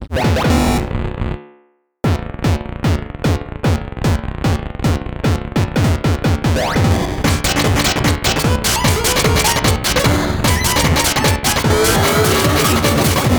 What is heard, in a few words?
feedback drum; lsdj